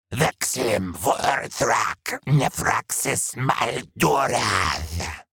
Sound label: Demon beast imp monster spell casting